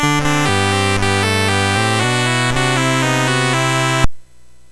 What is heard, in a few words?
MOS6581R3, GAMES; Who Killed Cock Robi BASIC; HVSC